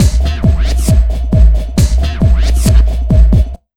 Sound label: Big Beat Drumloops, 135